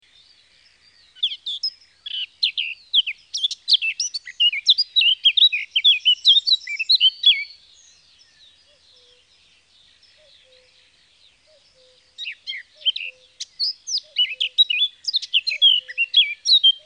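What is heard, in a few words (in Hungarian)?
Barátposzáta; Madarak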